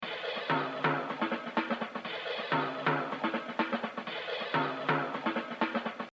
Exercise, Jazz Drumming; Mel Lewis; The Evolution of Jazz Drumming (Gottlieb)

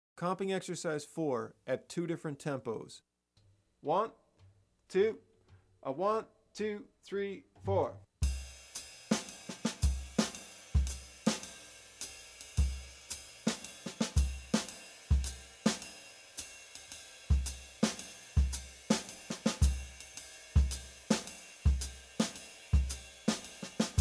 Comp Ex. Slow, Bop Drumming; The Art Of Bop Drumming; Riley

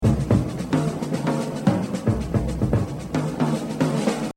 Jazz Drumming

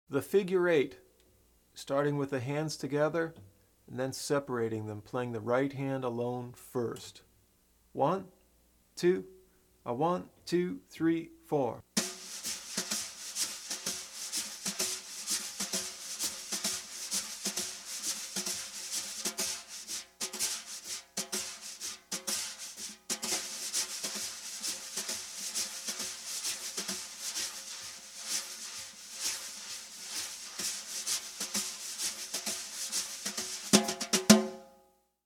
Figure 8, Bop Drumming; The Art Of Bop Drumming; Riley

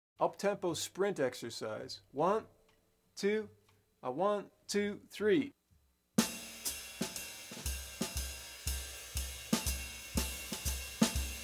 Uptempo Sprint, Bop Drumming; The Art Of Bop Drumming; Riley

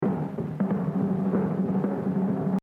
Exercise 8, Jazz Drumming - 100%; Gene Krupa; The Evolution of Jazz Drumming (Gottlieb)

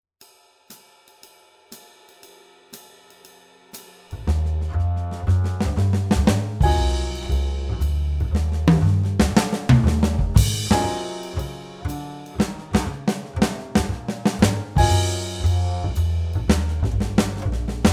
Swing, Drum Fills; Essential Drum Fills (Erskine)

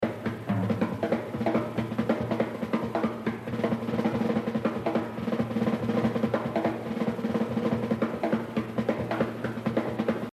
Jazz Drumming

